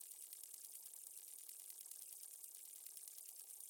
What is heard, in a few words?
Wires On Loop Trickle